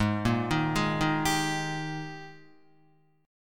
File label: Ab Major 7th Suspended 2nd Ab down Guitar; arpeggiated; JGuitar